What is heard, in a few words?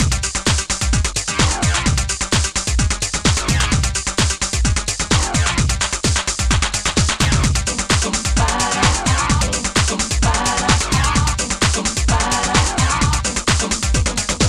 amiga techno